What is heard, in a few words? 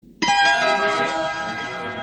drama harp